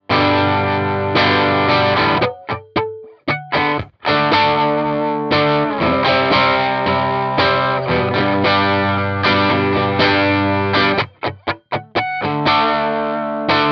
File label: bridge amp dirt